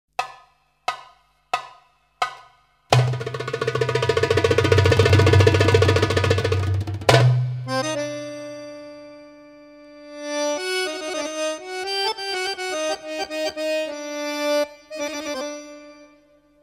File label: Baladi Akkordeon Oriental